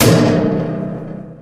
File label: metal grate impact hard; physics